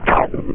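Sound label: spike off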